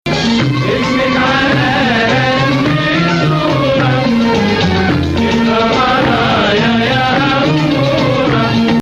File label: Nahawand